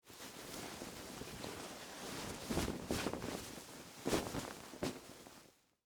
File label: Dress Shirt Put On, Foley, Clothes; Dressing; Human; BlastWave